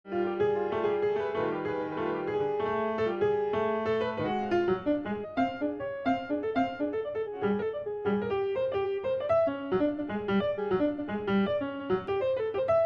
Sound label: classica